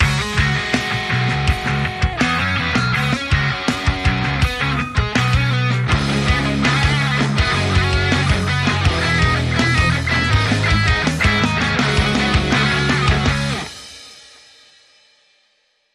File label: Metal Groove